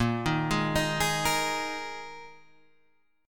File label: Bb 7th Suspended 4th Bb Guitar Half Step Down